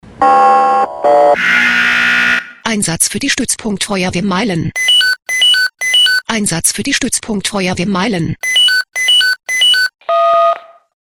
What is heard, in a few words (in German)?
SMS Alarmierungstone; FW Meilen